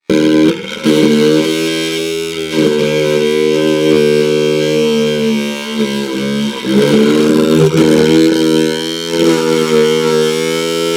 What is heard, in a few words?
Technology Electronic Trimmer Buzz On Hollow Surface